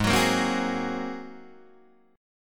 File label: G sharp 9th, Guitar, Open D; 6,9,8,6,9,6; down; chord shape